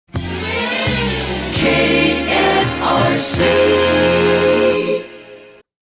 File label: Sung Once Slow; KFRC; You Series